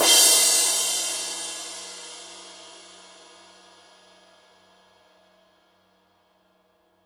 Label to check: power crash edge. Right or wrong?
Right